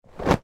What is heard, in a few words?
foley trousers drop on floor